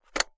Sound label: phone handset lift